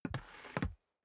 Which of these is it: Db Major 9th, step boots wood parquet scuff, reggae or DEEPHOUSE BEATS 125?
step boots wood parquet scuff